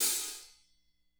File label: hat, drum